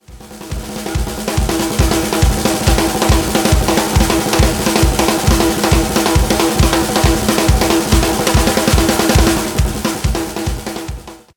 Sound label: dual snares